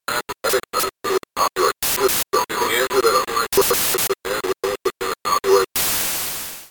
Radio Going In And Out Then A Cut At The End

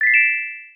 Ui Sound Approval High Pitched Bell Synth

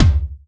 Tom

